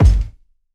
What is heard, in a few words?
kick one shot; TROPKILLAZ